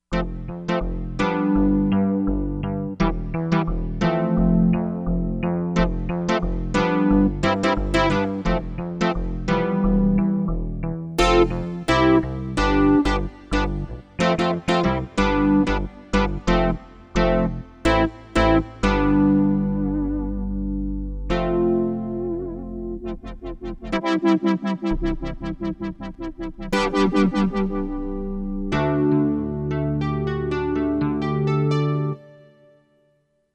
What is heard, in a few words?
Pulse Pad, Korg MSC-07S Synth